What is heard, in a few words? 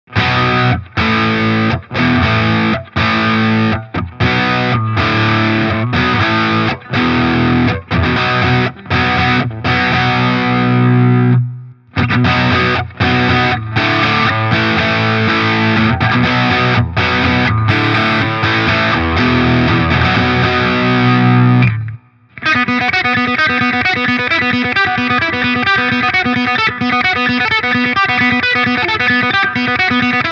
Z.Vex Sound of 59 Vertical Gain Max Tone 5 Boost on